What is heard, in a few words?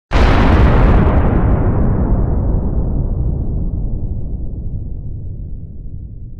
Nuke